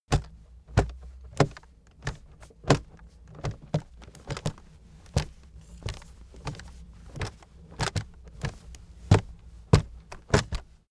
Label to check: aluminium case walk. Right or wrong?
Right